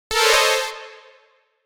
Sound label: Anime surprise accent